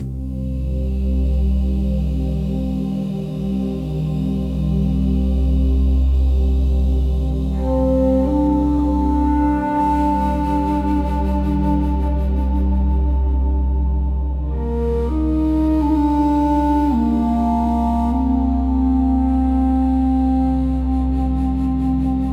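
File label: sounds of mother earth, didjeridu music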